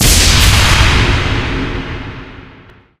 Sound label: spell spawn boss; halloween; video games; source engine; tf